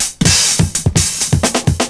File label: CYMB, Breakbeats